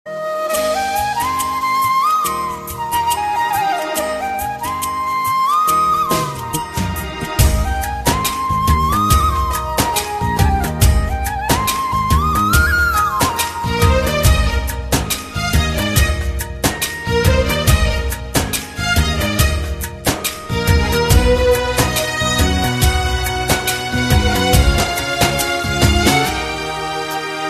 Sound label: Sweetest Flute Indian Ringtone